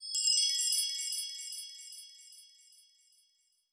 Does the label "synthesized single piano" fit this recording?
No